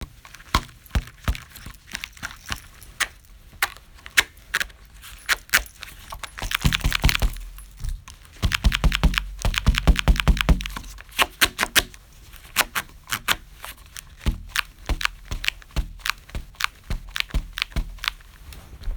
headphones, Foley; TRUTH-HZ; Crowdsourced Sample Pack

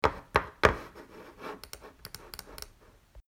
mouse, Foley; Crowdsourced Sample Pack; TRUTH-HZ

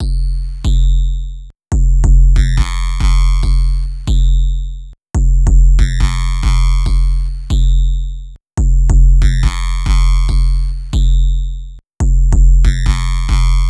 BIG LOOP, Bass; Crowdsourced Sample Pack

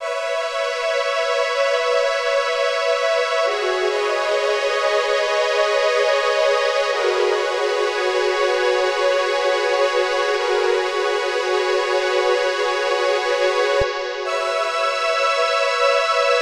ICE SYNTH 139BPM, Pads; Crowdsourced Sample Pack; DRIFTFUL

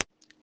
Perc, Foley; Crowdsourced Sample Pack; TRUTH-HZ

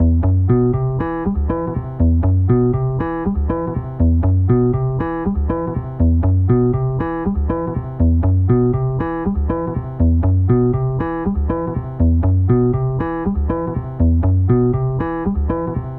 Crowdsourced guitar stranger things vibes; Crowdsourced Sample Pack; TRUTH-HZ

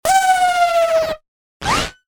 balloon, Digital FX; TRUTH-HZ; Crowdsourced Sample Pack

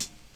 Hihat; TRUTH-HZ; Crowdsourced Sample Pack; Drum Kit; Hats

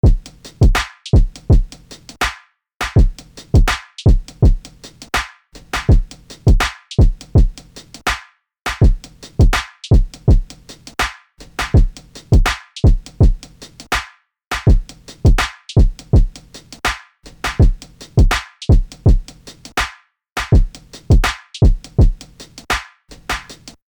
Drum loop; TRUTH-HZ; Crowdsourced Sample Pack; Drum Kit

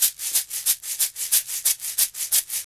Shake; Crowdsourced Sample Pack; TRUTH-HZ; Drum Kit; Percussion

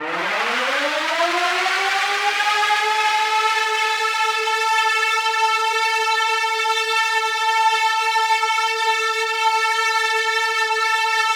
This was a saxophone [132 bpm]; Sound FX; Crowdsourced Sample Pack

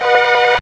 hooooooouh; Truth-Hz - 1-Hit KO Sample Pack

